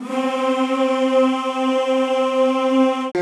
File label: CHO C3; Sound & Vision; Gigapack I CD 2; CHO Zzzz + Vvvv; CHO Vvvvv